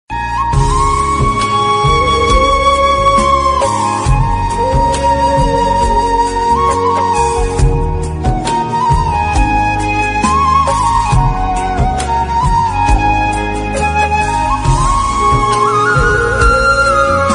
lofi remix

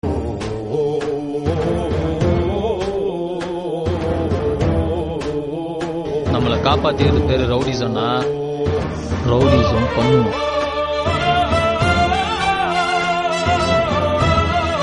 south chennai bgm

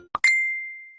facebook messenger tone sound

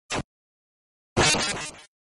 soul break; undertale

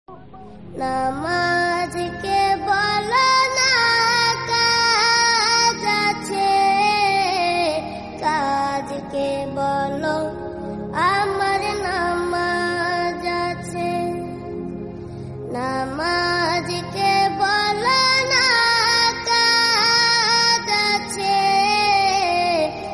bangla islamic song bangla gazal; mizanur rahman azhari; gojol

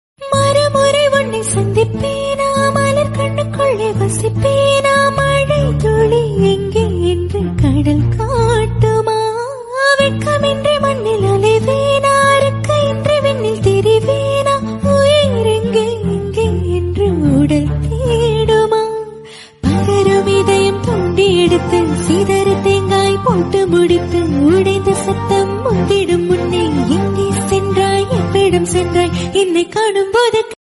female version south

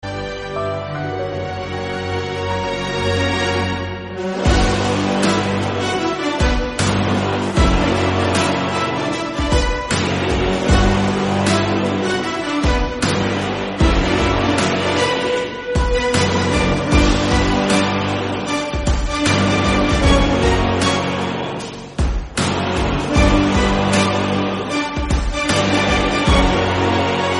bgm instrumental

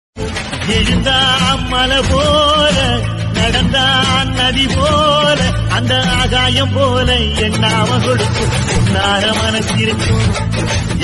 tamil song; vijayakanth

